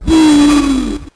"woo" robot, voice

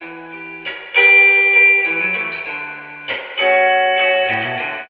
guitar, Loops Phonky

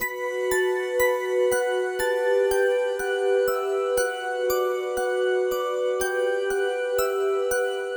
keys glass, sad loops

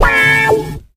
wahwah'd, Stabs

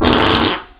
fart short

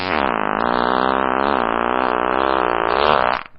fart long

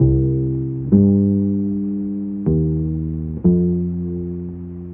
bass

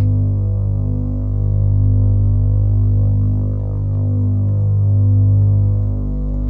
moog, Synths; Notes

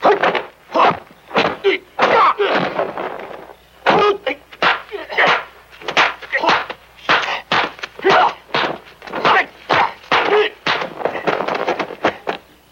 martial arts

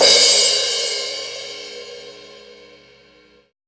cymbals electro

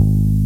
dilznick, Bass

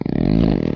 fart medium

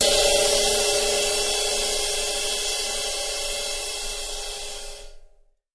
sizzler, cymbals

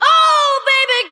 "oh baby", young, sung; ooh ahh

